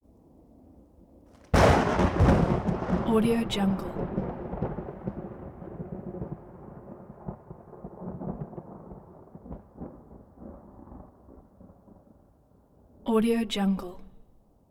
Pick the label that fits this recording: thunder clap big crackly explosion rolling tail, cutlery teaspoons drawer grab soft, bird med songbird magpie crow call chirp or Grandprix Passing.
thunder clap big crackly explosion rolling tail